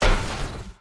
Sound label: aquarium boss land